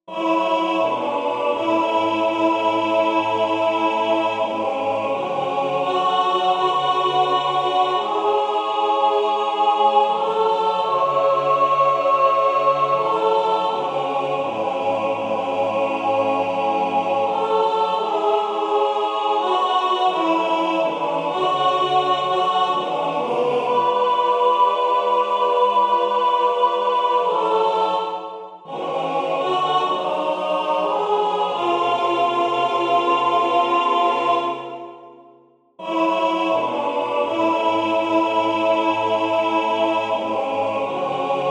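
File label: Swallows alto, wisdomchant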